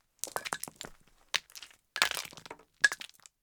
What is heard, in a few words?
Stones and rocks falling